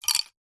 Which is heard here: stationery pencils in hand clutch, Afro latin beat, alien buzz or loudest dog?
stationery pencils in hand clutch